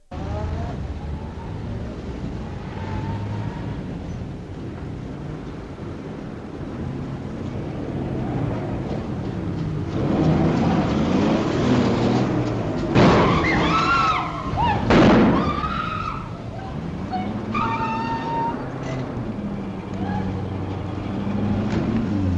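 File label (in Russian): две машины разбиваются или стреляют с криками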